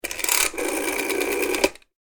household telephone old dial num 7, Telephone (Old) Rotary Dial Number 7; Telephone ( Old Style ) Rotary Dial Dialling Number 7; Telephones; Electronics